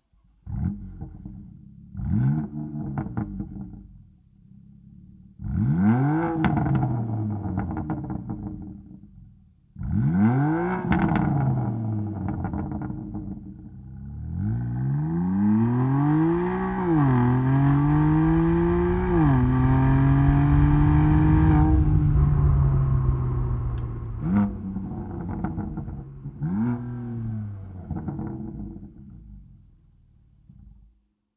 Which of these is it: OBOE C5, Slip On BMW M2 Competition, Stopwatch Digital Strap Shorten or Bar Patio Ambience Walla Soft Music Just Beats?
Slip On BMW M2 Competition